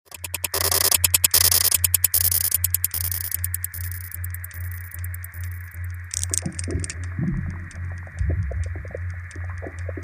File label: Airbell